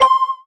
Combo

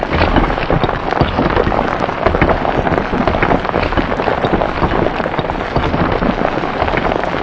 Ball loop

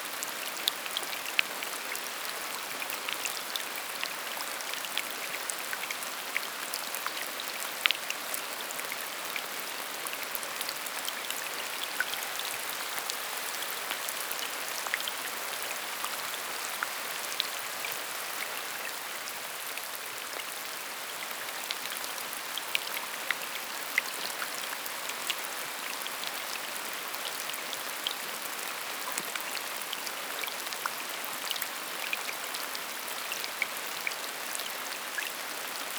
environment rain drizzle water Spline; Aragami

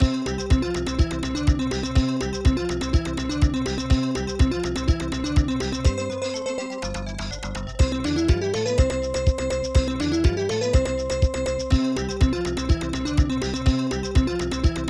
Shop Menu BGM; Quiz King of Fighters

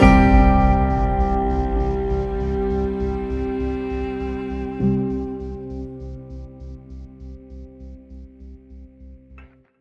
Tension Stop 100bpm; project zomboid

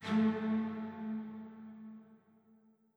chime; chime sharp

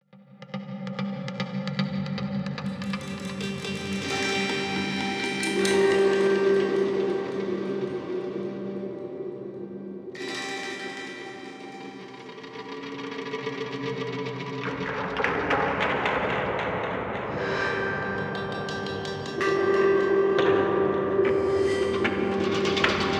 Dialogue Music; Aeon Must Die

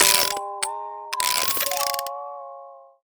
Mini Music Man Try Play Music

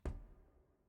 Player Footsteps Clock Tower Step; Main Character

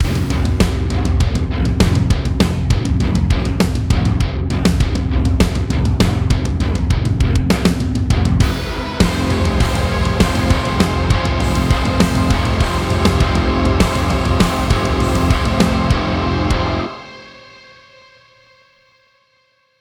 Cutscene 100bpm 28beats; Chiliboss

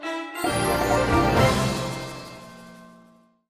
Level completed; Scrubby Dubby Saga; Android

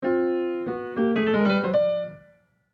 Piano

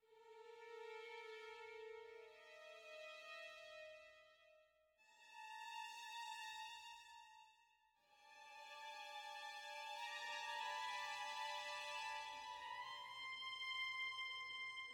ambiant dark

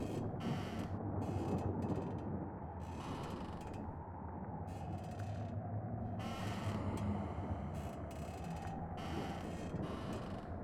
Ambience Haunted Mansion 140bpm; 73beats

